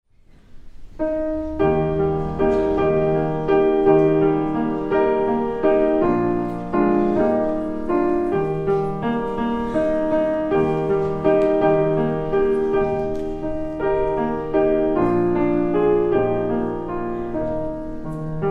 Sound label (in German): Vorspiel